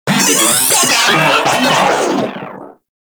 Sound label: Moa Vocal Death; Warframe